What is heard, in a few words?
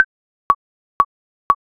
click 120bpm; ardour